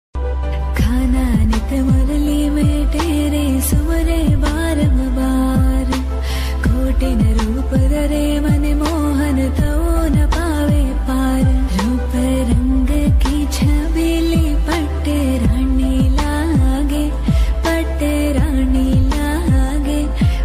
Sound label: bhakti ringtone